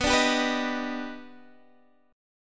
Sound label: D sharp Augmented Major 7th B